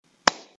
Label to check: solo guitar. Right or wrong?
Wrong